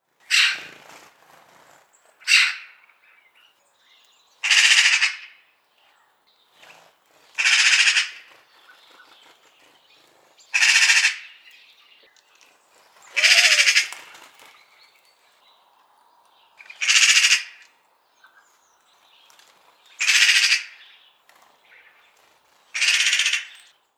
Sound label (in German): Elster Ruf